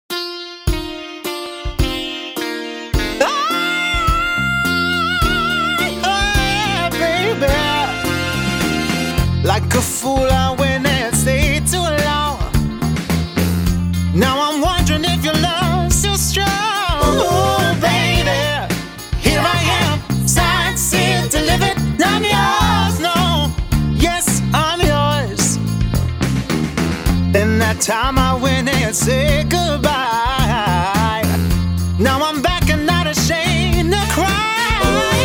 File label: Singing, Soulful, Fun, Powerful, English